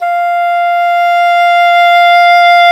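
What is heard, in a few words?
WND, English Horn, Swell; Roland L-CDX-03 Disk 1; Eng Horn Swl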